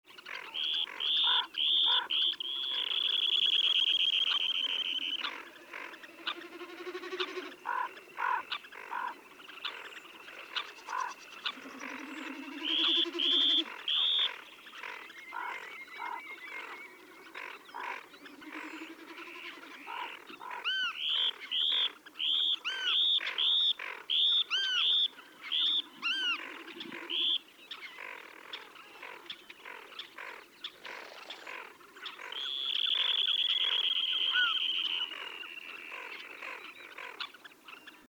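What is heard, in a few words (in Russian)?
chernozobik golos, dunlin voice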